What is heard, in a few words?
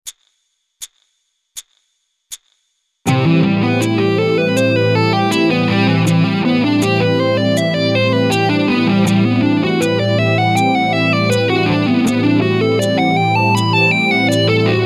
Sweep example